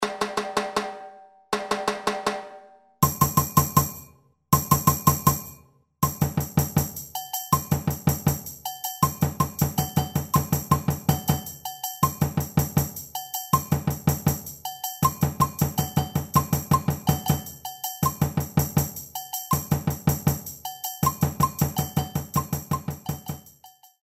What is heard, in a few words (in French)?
samba reggae shaker sourdo agogos